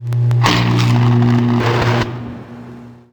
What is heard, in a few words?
roar